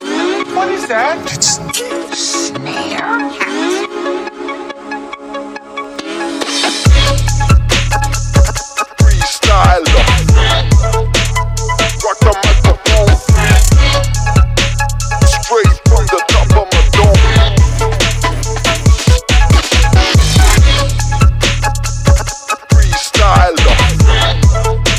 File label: kick snare hat phonk